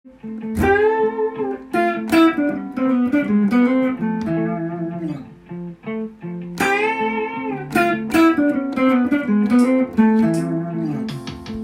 blues jazz

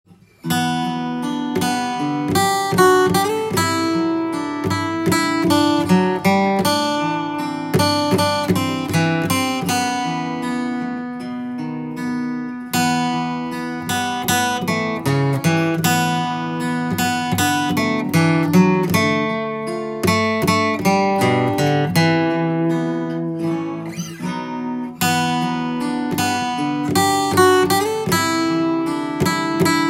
energyflow guitar

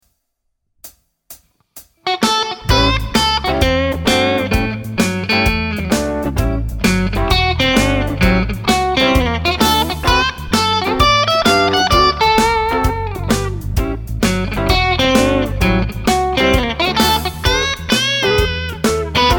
A13 guitar solo

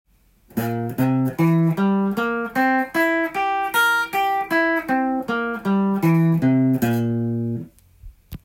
c7 chord tone, guitar

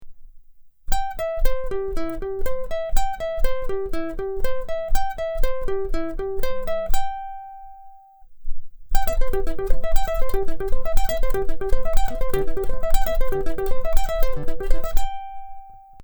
picking

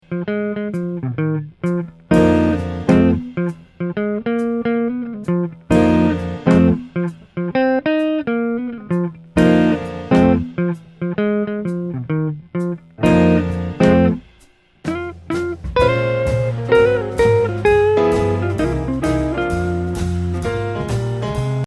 yamaha, guitar

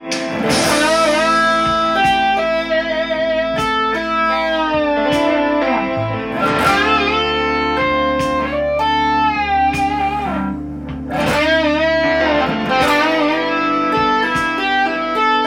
am penta gm penta

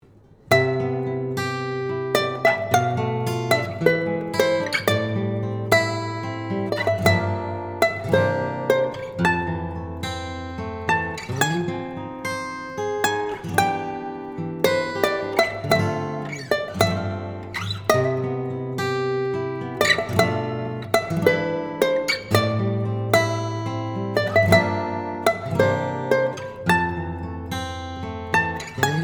ukulele

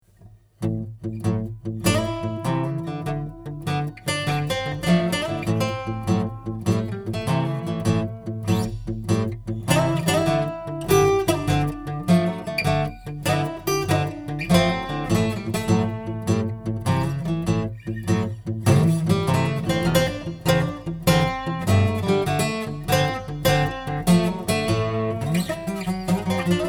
Blues solo

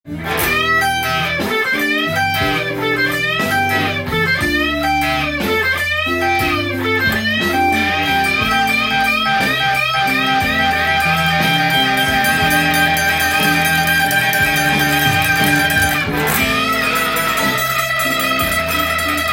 solo, guitar; how to play ballads in a cool way

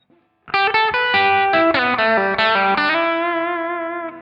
E blues, guitar

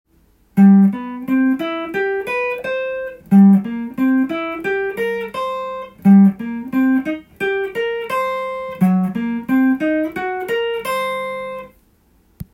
chord tone, guitar; good way to practice chord tones

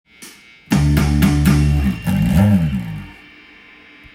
bass

